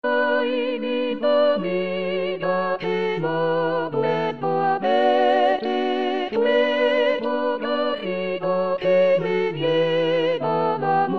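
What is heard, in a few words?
soprano; Ay Linda Amiga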